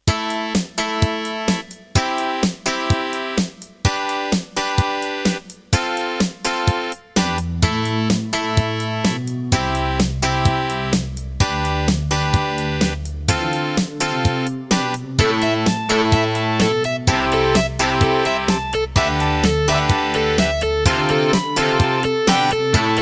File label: Wave 1 [Dreamblaster S2]; Serdaco; raptor call of the shadows